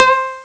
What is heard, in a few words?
Real Koto, Amiga Soundtracker; ST-XX Amiga Soundtracker Sample Packs